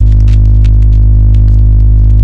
VINYL; jazz jackrabbit 2

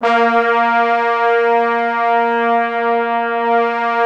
A#2, Orchestral Brass; Best Service ProSamples vol.20; AKAI

